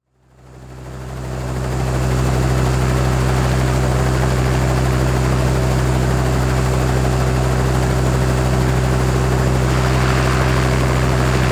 Ambience Helicopter Idling Long; AdobeCC

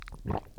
Human Male Swallowing

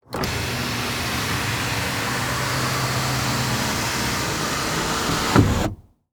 Truck GM Envoy Close Sunroof; transportation

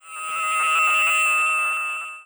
Transition Digital Scanner; Production Element Title Transition

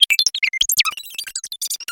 production element data fx, Distortion Glitch SFX; PremiumBeat

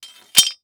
Weapon Broad Sword Fall On Stone; AdobeCC

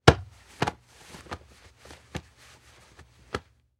Impact Human Grab Clothes Rustles

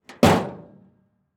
Truck Ford Ranger Close Tailgate; transportation

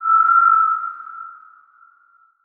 Transition Musical Tone Medium; Production Element

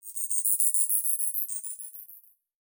Multimedia Internet CD-Rom Flash Click Multiple

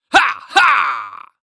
Human Cowboy Ha Haa

